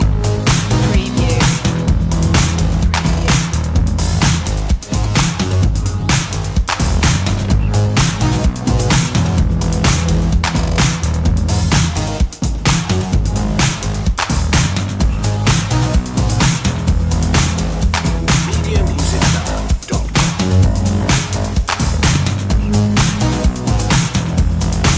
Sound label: robo-disco loop